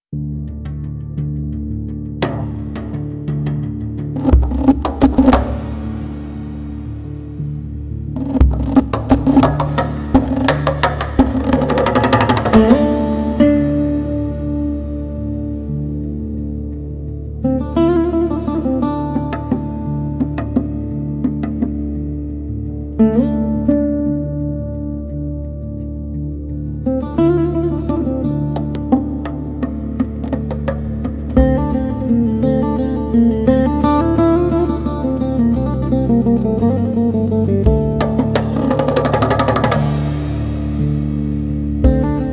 Calm

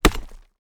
axe chop into wood little debris

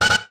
Futuristic Weapons Sound Effects

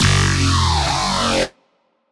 Futuristic Weapons Sound Effects, SpaceShip

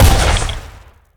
plasma pistol shot

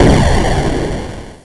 Explosion, Retro Game Weapons Sound Effects

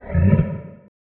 creature roar

